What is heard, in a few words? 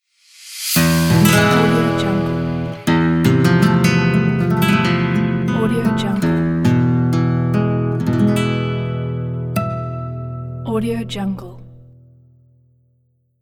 spanish guitar short logo; cogunaudio